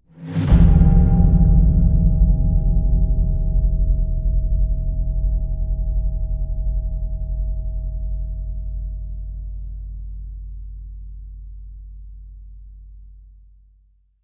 booms mystic bell with whoosh

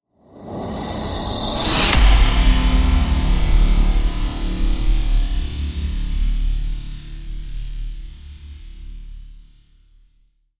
hit shred; brand x music; adrenaline surge

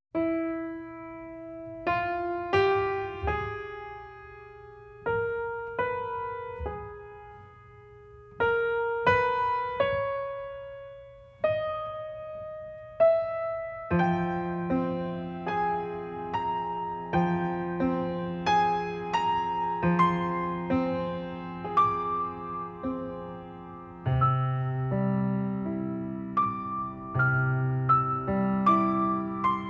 piano

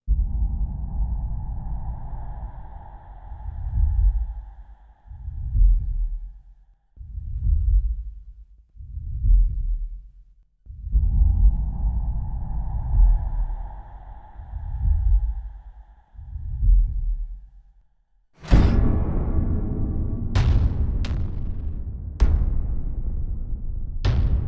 percussion only

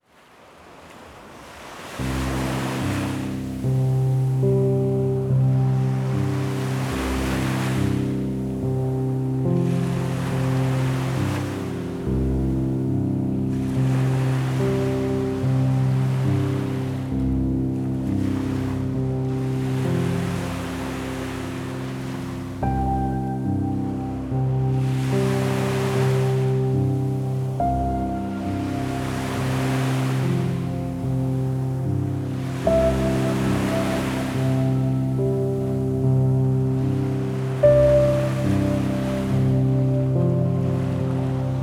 piano, sleepy relaxation ocean sounds

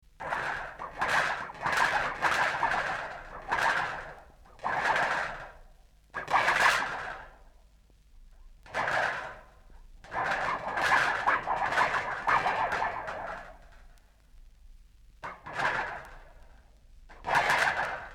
micro glass on goblet; annea lockwood

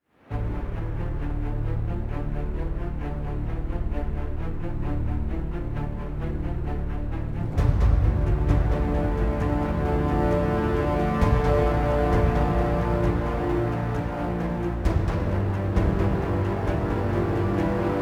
no choir

